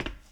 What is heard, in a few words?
ceramic step; Golden Crayon Sounds